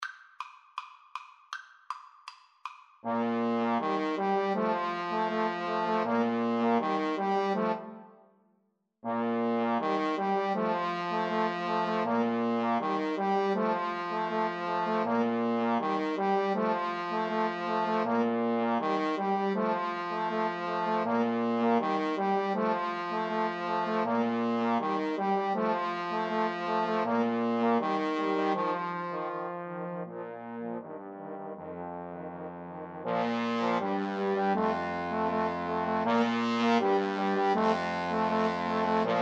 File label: trombone trio